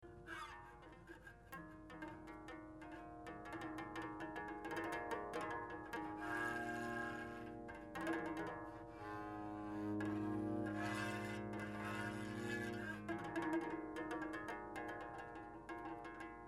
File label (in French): archet, harpe; touchers de la harpe